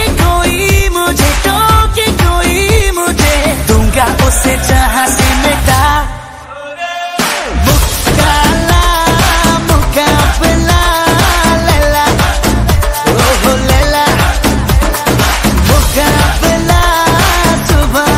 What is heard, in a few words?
sweet ringtone, bollywood ringtones